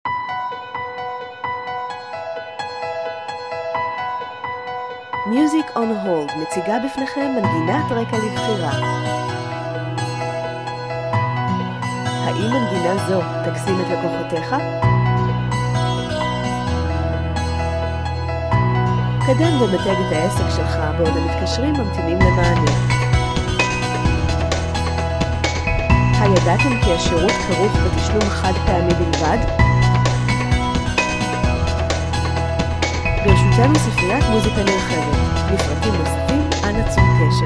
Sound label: corporate shine